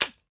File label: AK end; guns n zombies 2014 pc